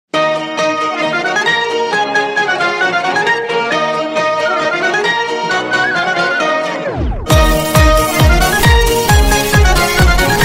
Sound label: Instrumental